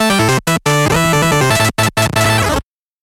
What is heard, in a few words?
8bit jingle slow